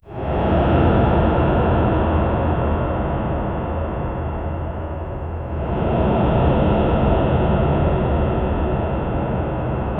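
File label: silent echoes within a cave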